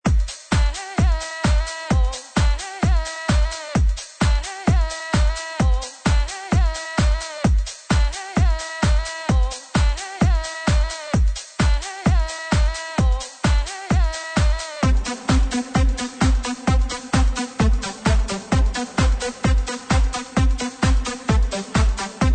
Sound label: Trance Rain Days